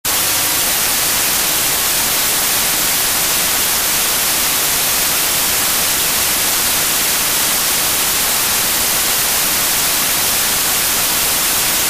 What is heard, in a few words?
white Noise